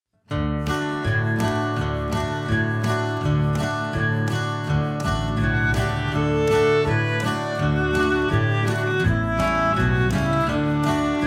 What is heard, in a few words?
Violin